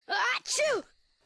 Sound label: sneezeing